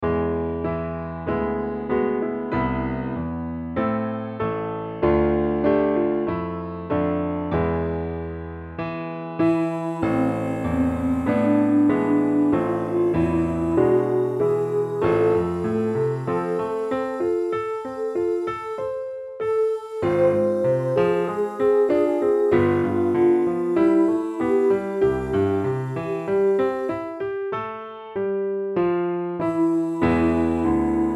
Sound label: Piano Study C LOW